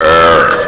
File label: burp